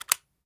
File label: m4 selector semi on; gmod; viper